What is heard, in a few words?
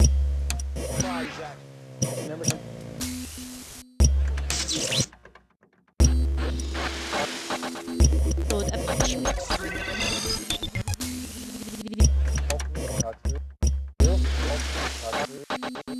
Kudzu shed Television Beat Lp